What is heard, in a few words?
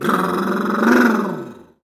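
attack windup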